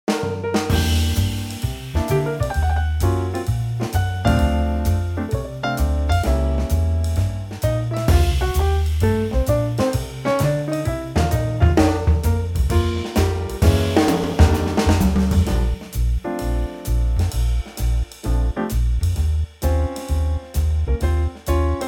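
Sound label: trio B flat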